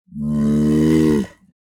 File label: Sea lion grunt; fish and aquatic